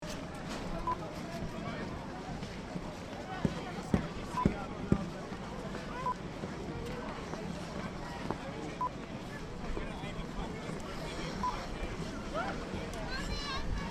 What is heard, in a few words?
Outdoor Ice Rink Ambience UK